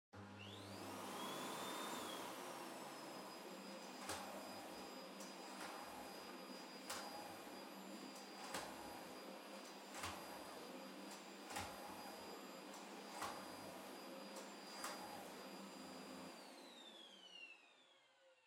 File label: Vacuum Cleaner Far Vacuum Floor Soft Low